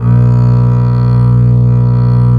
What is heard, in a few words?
C BASS A1 X, STRNGS; AKAI S-Series CD-ROM Sound Library VOL-8